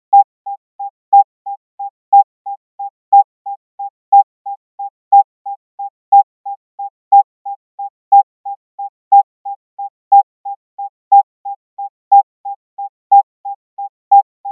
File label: 800Hz 3 beat per sec; music; rhythm